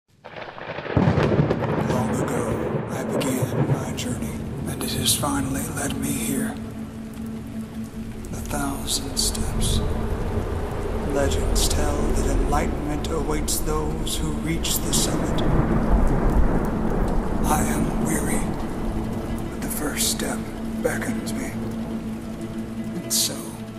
spaceship

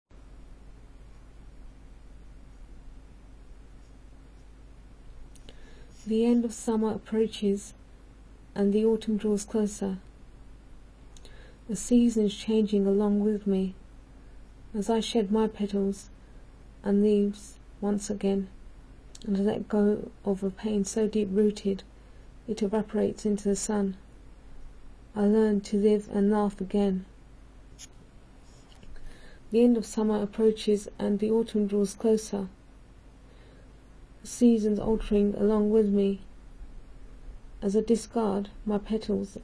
harp

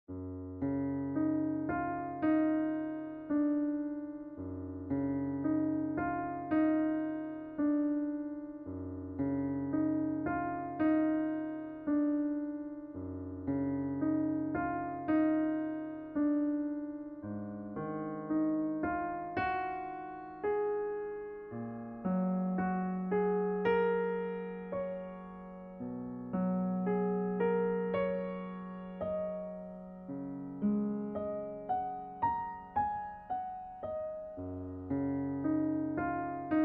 piano solo

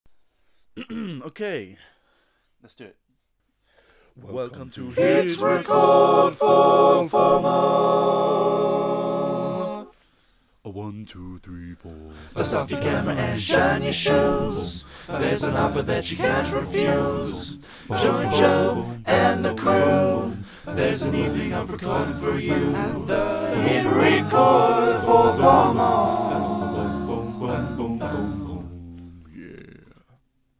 Intro Harmonies

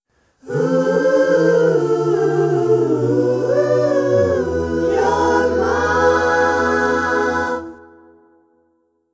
spaceship

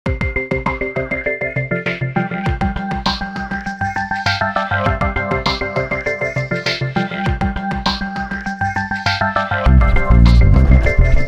funky bells

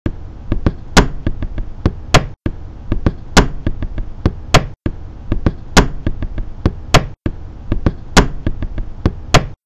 desk beat

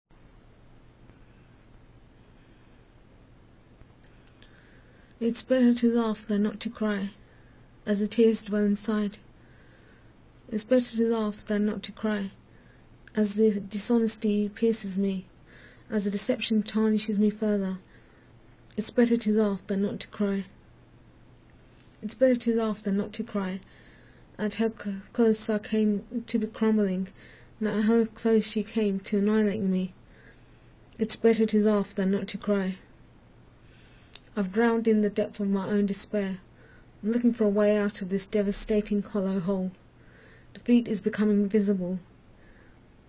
harp

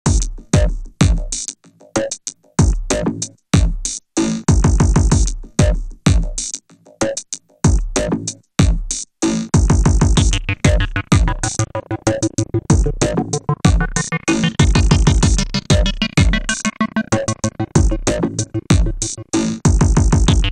Arp

